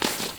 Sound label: Ski Turn; Misc Sports